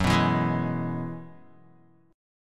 F sharp 7th Suspended 2nd Suspended 4th F sharp down Guitar; strummed; F#7sus2sus4; 4F,4A,5C,5G,5C,6E